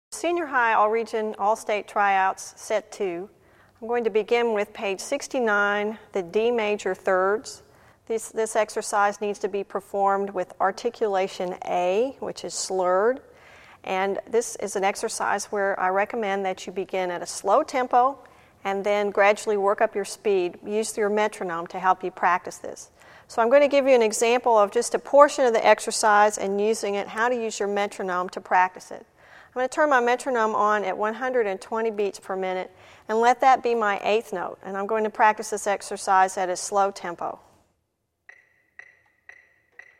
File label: Saxophone, D Major 3rds